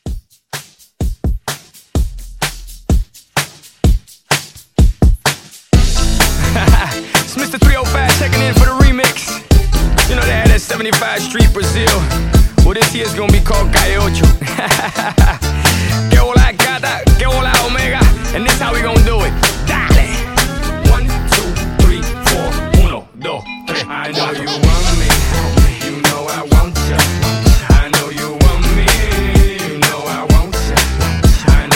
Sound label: Mashup 127